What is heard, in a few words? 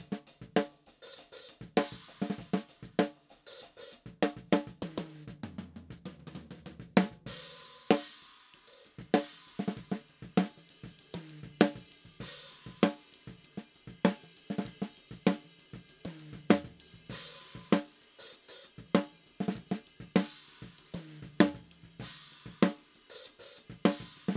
Snare